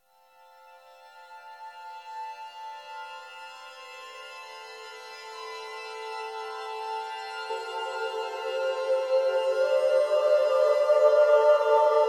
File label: ambient angelic, music